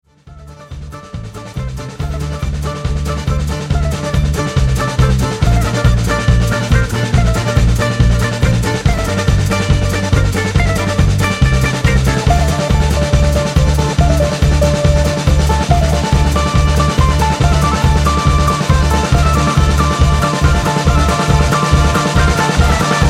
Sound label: balalaika techno trance